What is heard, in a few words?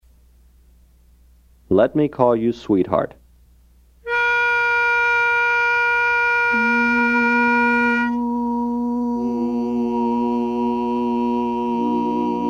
Lead